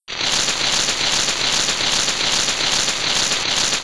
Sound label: gohma crawl; zelda